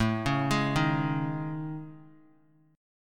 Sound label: A Major 7th Flat 5th, Guitar, chord; AM7b5 arpeggiated; JGuitar